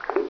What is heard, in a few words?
footstep left